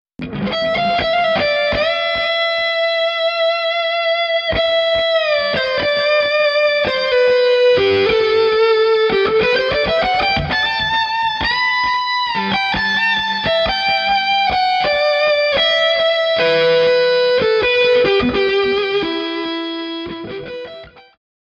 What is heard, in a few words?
With Delay